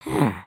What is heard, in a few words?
Idle Bobbing; Lego Strombie; creatures organic